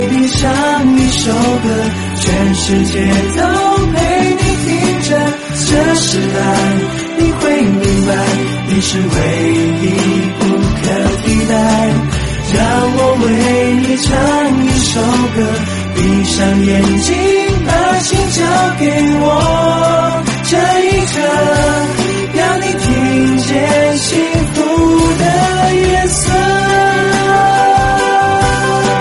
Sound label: blue meteor shower; vivo